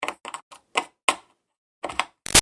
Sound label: 1-4 Inch Plug In Guitar Amp Fiddles; Guitar Amplifier; BigRoomSound